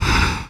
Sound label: cast